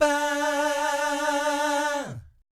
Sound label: VIB FX, Jazz FX